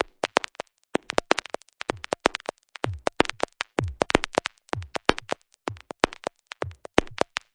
127 bpm microscopic analysis, drum loops and breaks